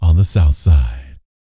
Vocal Planet R&B Dance BASS CATCH

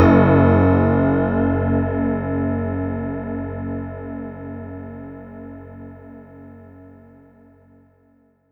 F#1, synth; 90 s Sample CDs; NiceBeats; SynthLine VOL-23

